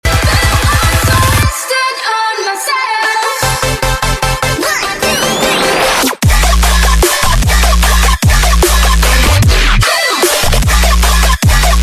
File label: remix